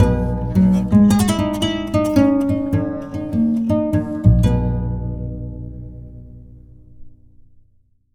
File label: KORA; Sonic Foundry (Sony Creative Software); Continental Drift Aftershocks; African